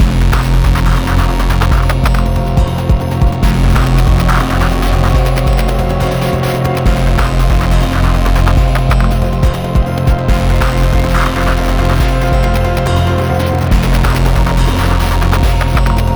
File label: Glitch sonata Medium